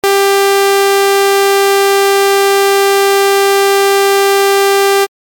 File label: beep